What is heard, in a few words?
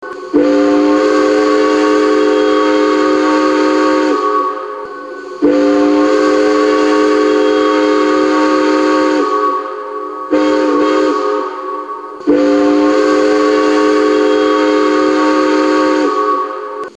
horn